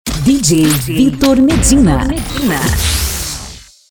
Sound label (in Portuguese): Vinheta Dj